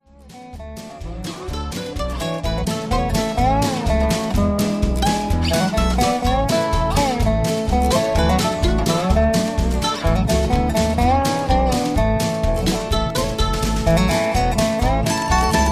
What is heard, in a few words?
Appalachian Mountain Girl Instrumental